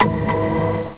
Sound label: ALERT